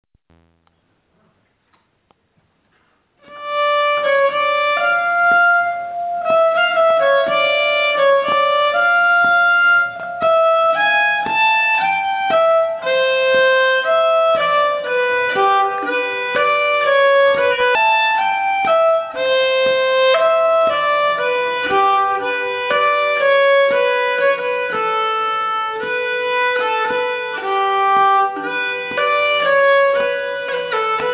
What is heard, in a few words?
Lent, nyckelharpa; Porte Monnaie Lent